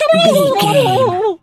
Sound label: turkey ostrich hurt gobble